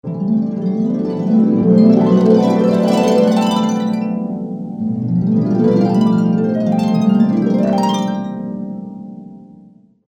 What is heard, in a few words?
harp